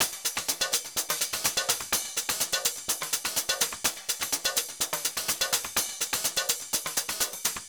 Nova beat top layers, 125 Beats

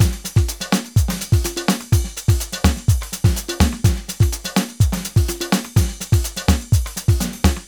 125 beat busy, Nu Jazz House; Nova; PowerFX

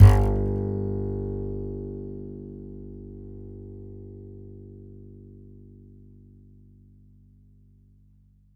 E, E string upright bass; Larry Seyer

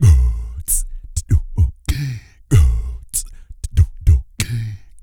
DEEP BREATH, Vocal; Blues & Soul

